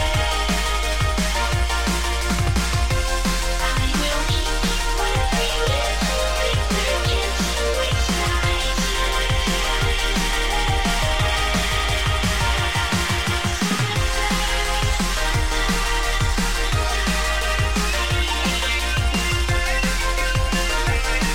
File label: drum atmosphere